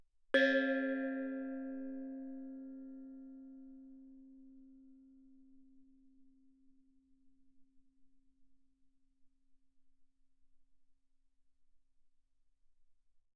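thai gong C4 fortissimo, Percussion gongs & tamtams; thaigongs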